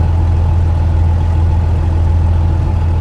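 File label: zlin cockpit left, engines; War Thunder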